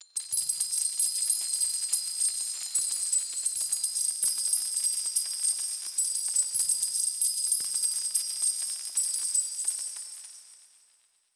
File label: coins Fall; netent